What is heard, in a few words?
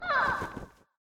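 succubus damage; monster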